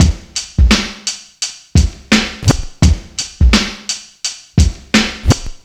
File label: bomber, Freak Beats, 85bpm